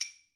claves mf

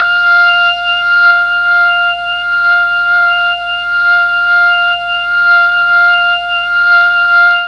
F4 organ, MKII mellotron

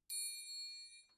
triangle hit mp muted; Idiophones; Struck Idiophones; Triangles

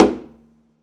pizz noise body slap high, double bass; dsmolken; noises

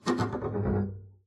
arco noise jumpy, double bass; dsmolken; noises

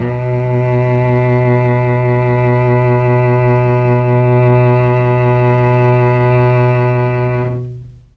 B1 300 CELLO, mellotron; 300 STRINGS

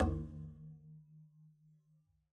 pizz noise low thump, double bass; dsmolken; noises

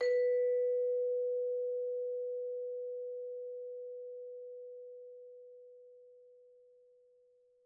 Vibes hard B3, Vibraphone, Hard Mallets; Struck Idiophones

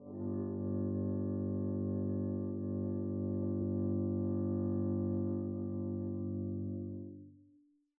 arco g1 p up, double bass; dsmolken

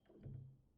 release G6, Upright Piano; Chordophones; Knight; Releases

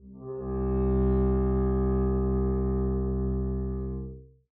arco c2 mf up, double bass; dsmolken

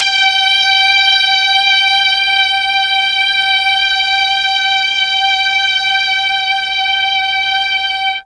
G3 16 violin, mellotron; TRON 16VLNS

